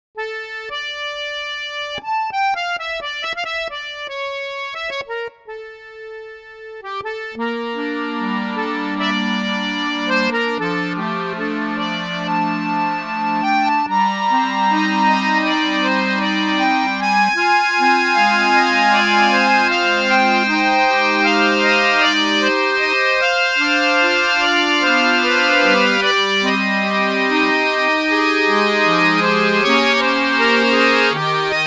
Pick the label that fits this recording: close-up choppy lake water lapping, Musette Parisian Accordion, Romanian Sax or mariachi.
Musette Parisian Accordion